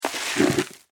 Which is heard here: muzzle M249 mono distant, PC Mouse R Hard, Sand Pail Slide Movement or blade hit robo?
Sand Pail Slide Movement